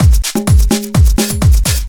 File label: Big Funky Conga, Drum Loops, 127 BPM; Sonic Foundry (Sony Creative Software); Underground UK House & Electro